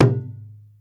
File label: WAYANG KULIT A6; Spectrasonics - Heart of Asia CD2